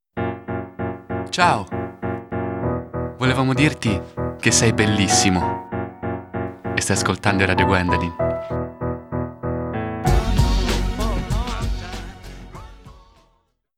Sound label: Jingle